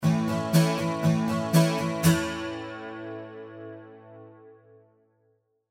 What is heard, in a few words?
Flanger; PCM81